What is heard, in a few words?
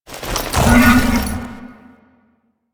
Horse Gallop Brakes; Foley Actions; Warframe; Vehicles; Duviri